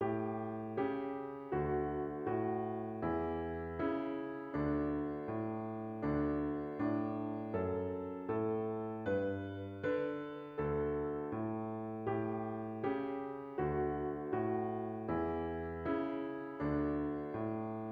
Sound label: twinkle with piano slow 80 piano